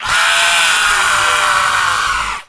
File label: Alien screams